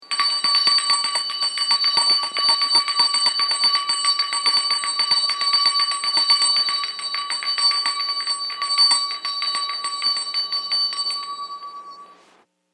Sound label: Small Hand Bell Vintage; Bells; Musical